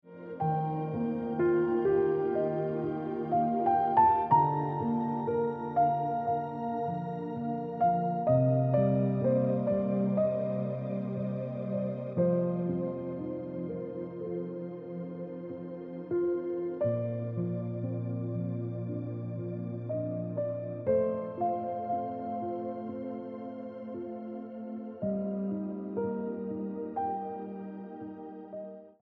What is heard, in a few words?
Ringtone Piano Music